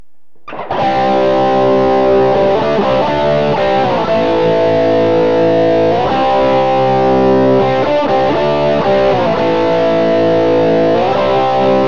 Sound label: bridge crunch; Burny